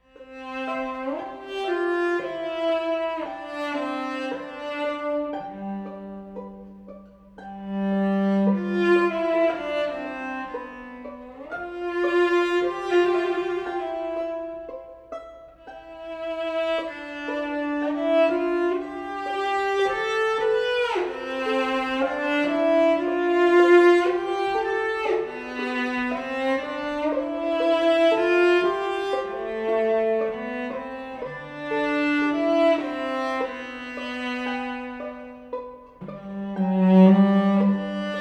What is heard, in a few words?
Strings Largo from Winter